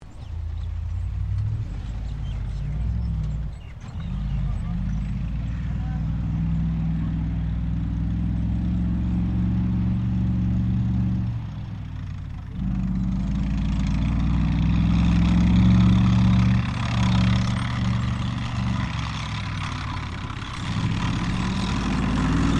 TANK M4 SHERMAN MANOUVER DISTANT, American M4 Sherman Tank; Truck Sound Effects; Sound Effects:Vehicles